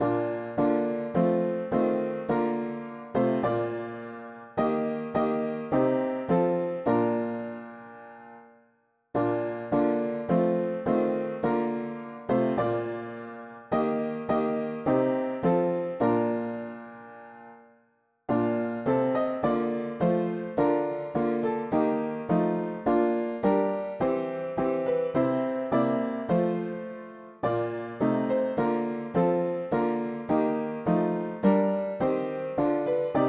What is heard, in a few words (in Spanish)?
mono piano; hymns; Himnario pequeno 2Pte